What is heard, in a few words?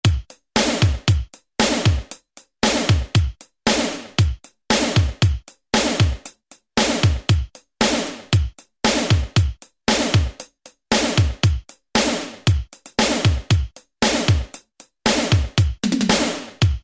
Hard Rock, Alesis SR-16; Preset Patterns